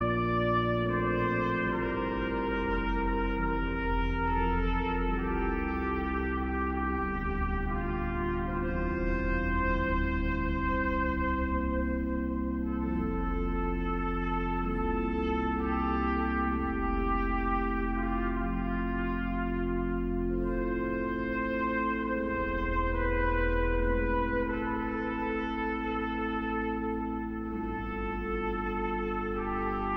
orgel

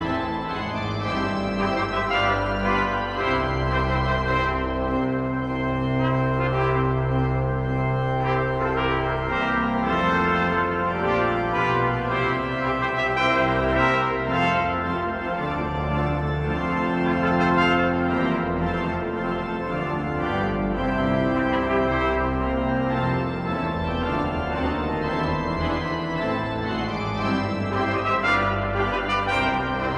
instrumentaal

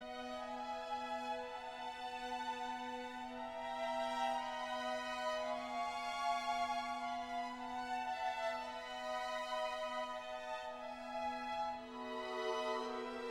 Adagio Conc voor piano en strijkers; Mendelssohn & Bartholdy

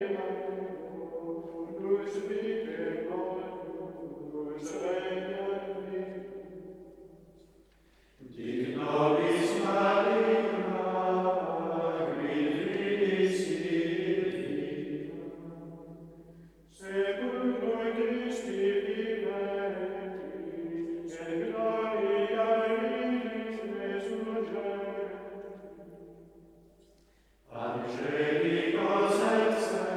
Koor (mannen) Gregoriaans; Victimae paschali laudes

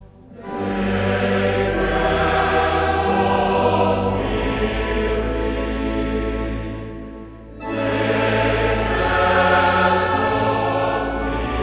Koor mannen; Mastreechter Staar

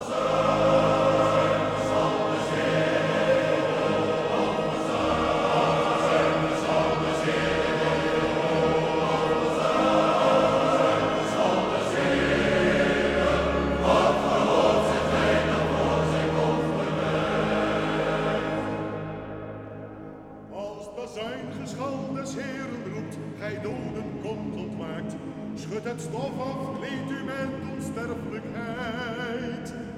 Koor (mannen); Kamper

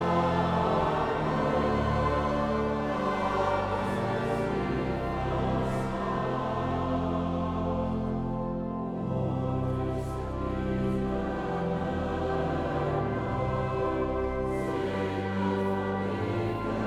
Koor gemengd

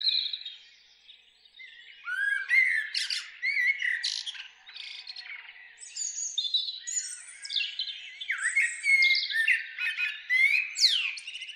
Natuurgeluiden, Vogels

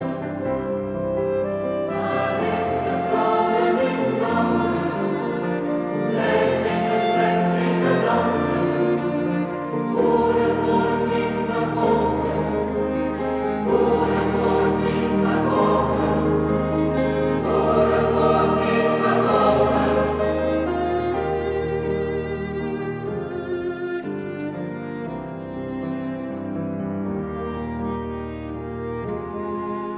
Koor gemengd

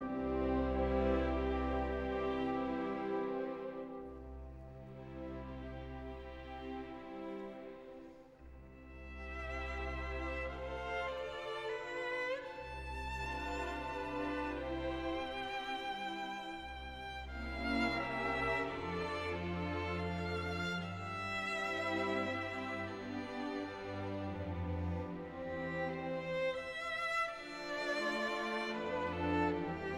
viool

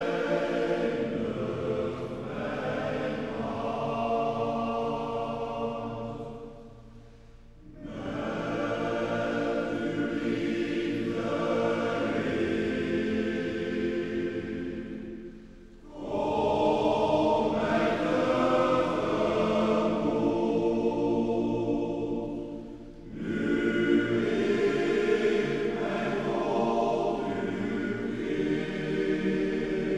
Koor (mannen); Ermelo`s

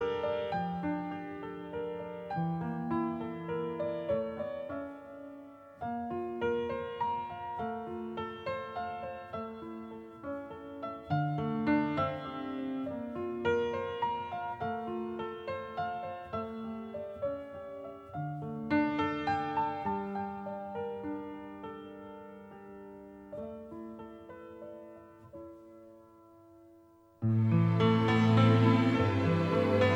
instrumentaal

